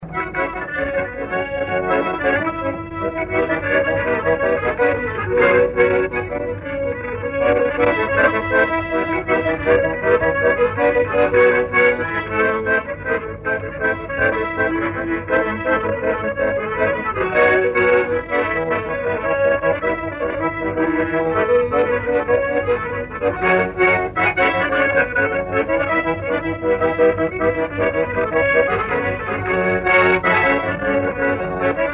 Lelita la armonica; jocuri si cantece batranesti; Vaslui

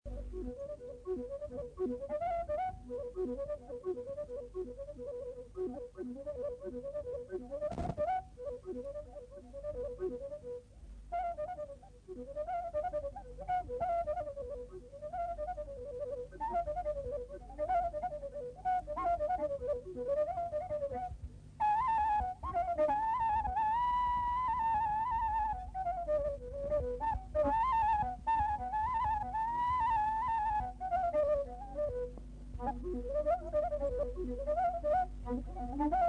Sarba la fluier; jocuri si cantece batranesti; Vaslui

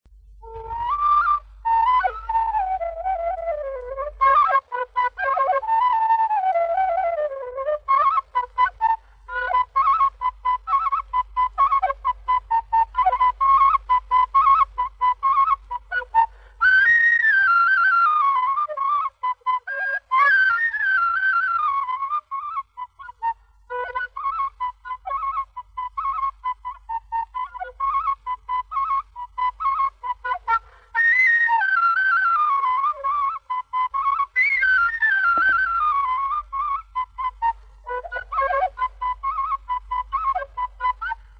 Cantec batranesc la fluier; jocuri si cantece batranesti; Vaslui

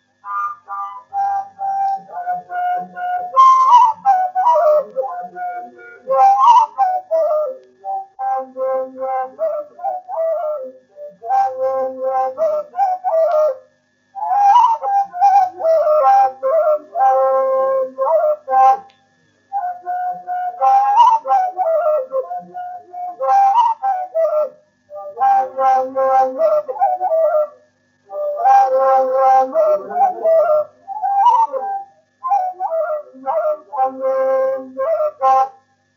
Jalea fluier mare; Vaslui

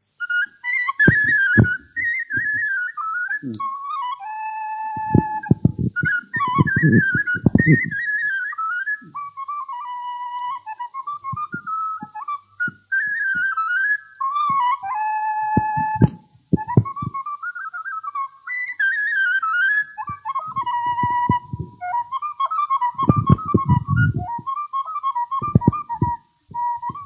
Ruseasca fluier; Vaslui

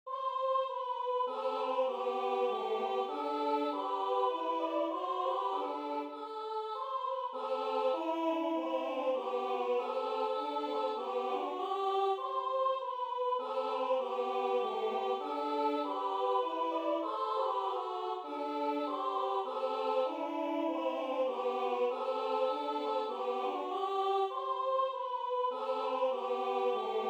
Sculati sculati boieri mari, Bucovina, colinde

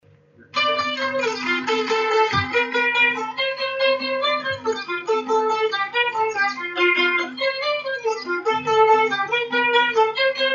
Polca batraneasca titera; Vaslui

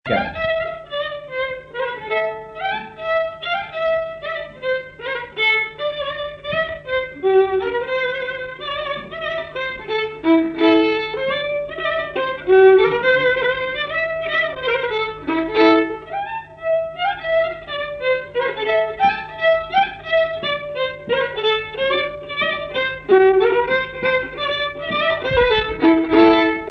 Floricica la vioara; jocuri si cantece batranesti; Vaslui

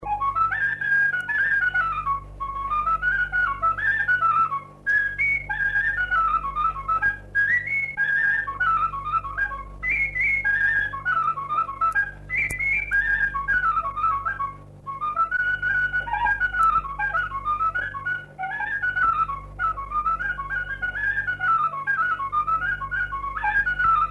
Botosanca la fluier; jocuri si cantece batranesti; Vaslui

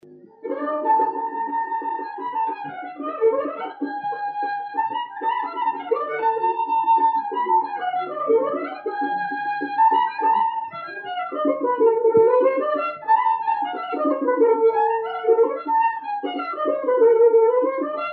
Clopotelul vioara; Vaslui

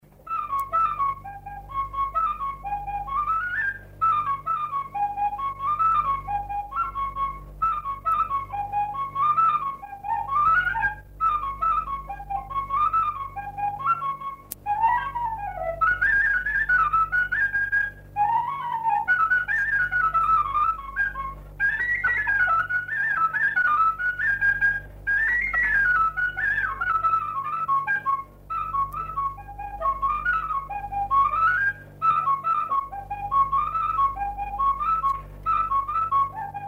Sapte gaste potcovite la fluier; jocuri si cantece batranesti; Vaslui

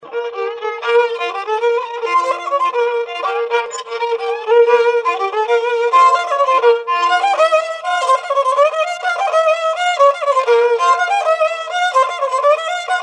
Zurgalaul la vioara; jocuri si cantece batranesti; Vaslui

